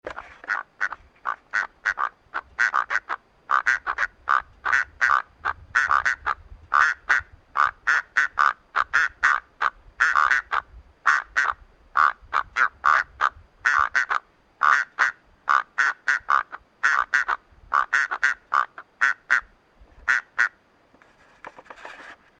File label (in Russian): zvuki chernogorloj maloj drofy